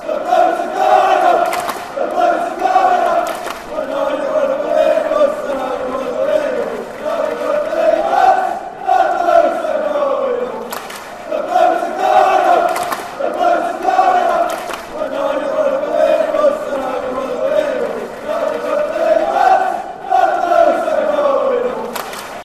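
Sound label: blues are going, football chants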